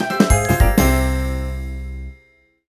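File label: jingle dialog quest end; Girls RPG Cinderellife; 3DS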